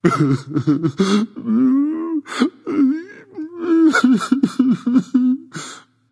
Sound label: boohoo; smite; kuzenbo